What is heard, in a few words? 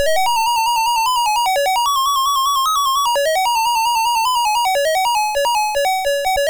Quari, MOS6581R2